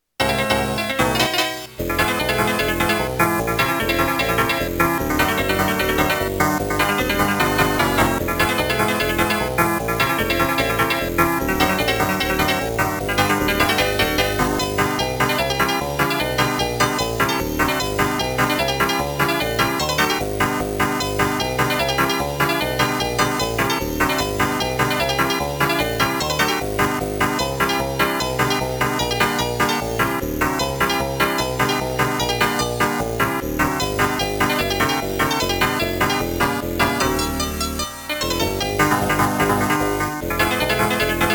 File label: 8-bit sample lerp; SMW